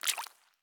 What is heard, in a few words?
walk puddle; Escape from Tarkov